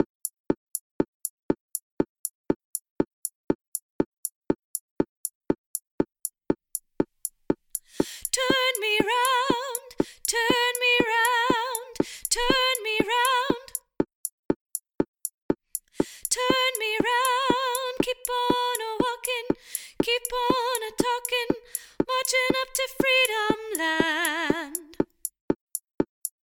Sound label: Soprano